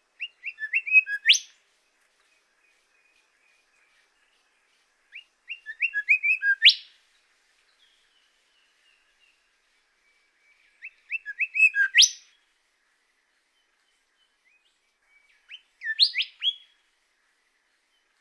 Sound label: golden whistler; Bird